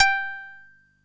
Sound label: koto G4; East West; Global Sounds